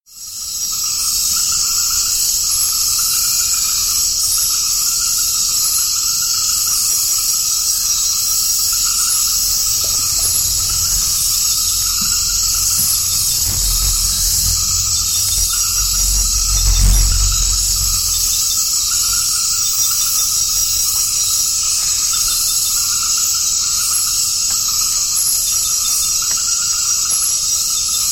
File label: Forest Swamp